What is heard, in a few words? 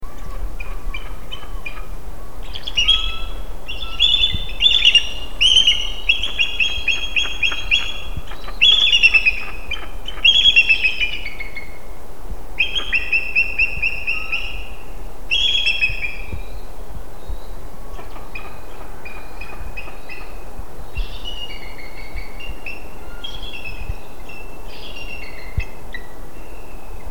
bald eagles chirping